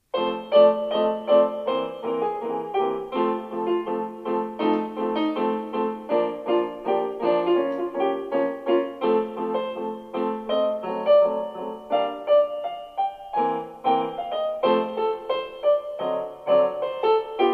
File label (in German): Klavier, in F Dur, Amerikanischer Modetanz